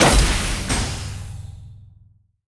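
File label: steamer gate death, monster; steamer crazy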